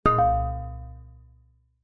multimedia alert notification ui ping chime mallet like error; zapsplat